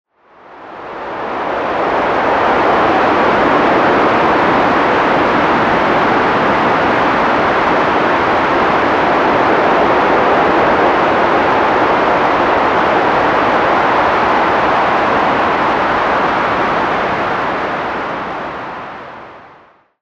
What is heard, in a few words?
Wind In the Pine Trees; ambient noise